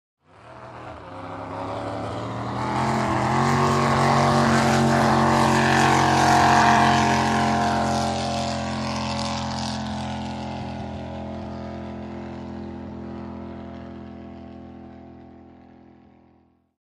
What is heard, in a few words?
Drag Boat Start by